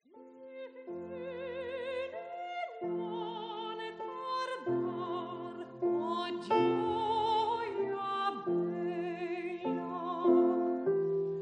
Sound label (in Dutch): Recitatief en Aria; Deh Vieni non tardar; W.A. Mozart